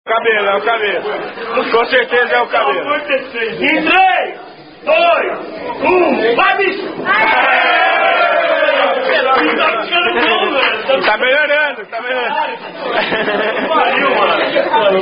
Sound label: Spoon Fight